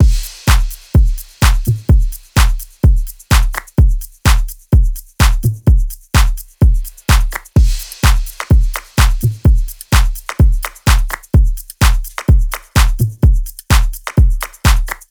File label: loop full 127; Seismic Loops